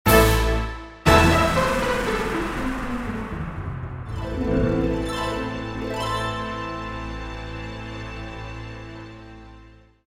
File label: Orchestral